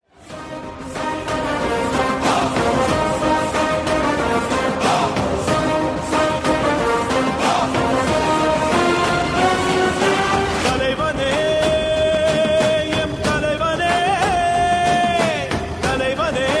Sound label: ringtone